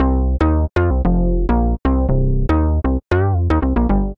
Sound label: Bass (C) 115bpm; Music Loops; Keys Of Life; Ableton; Singularities